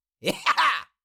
Cartoon little monster voice jump

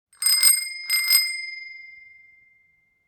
Bicycle bell rings two times

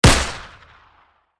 Pistol shot fired; shorts sound effects

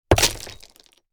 Rocks and various debris thrown

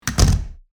Plastic PVC door close; household

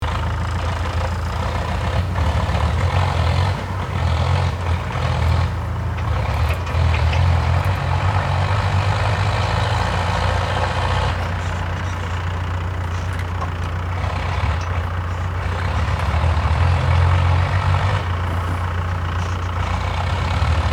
Rural farm tractor ambience loop

